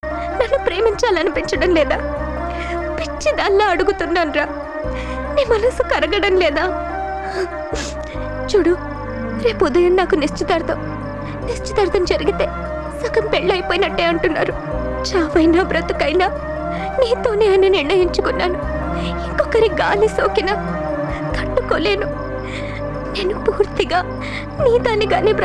Telugu love

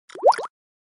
samsung bubble msg tone

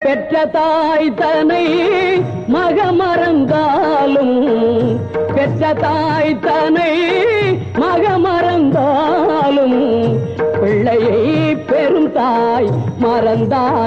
tamil

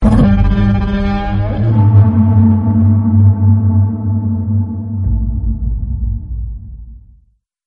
godzilla roar

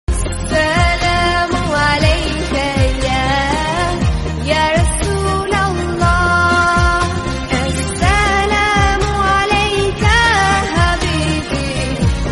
azaan, islamic

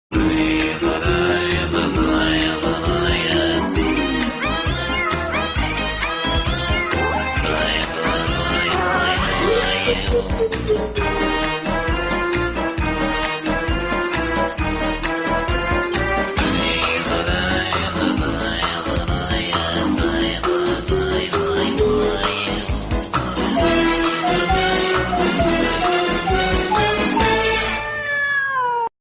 cartoon title music; oggy and the cockroaches

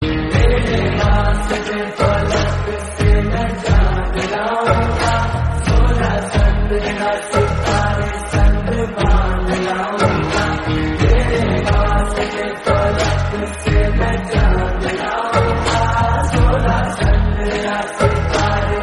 slowed hindi song

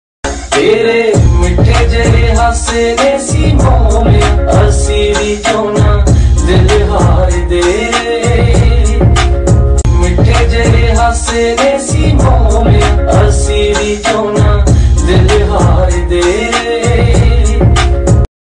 romantic Punjabi

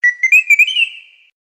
samsung touchwiz charging sound message tone

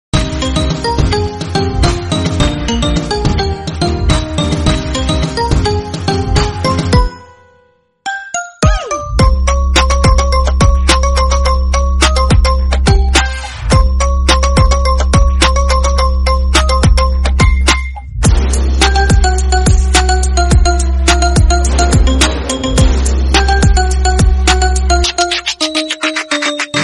remix instrumental

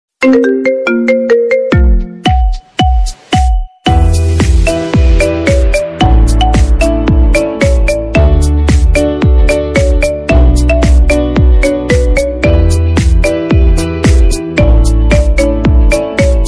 vibrant funk party

